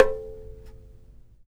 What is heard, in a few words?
TAR; Roland - LA Composer vol. 3